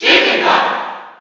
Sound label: Cheer NTSC; Jigglypuff; SSB4